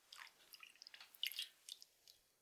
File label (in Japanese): ぬちゃ, 水音効果音